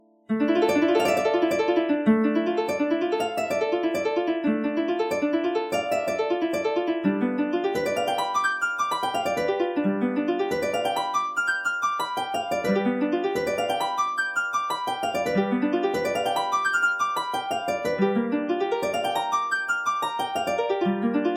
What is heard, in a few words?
Unlock Thunderhead; skyward sword